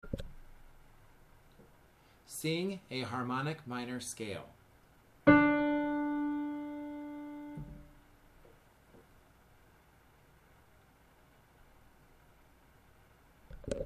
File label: Harmonic Minor Soprano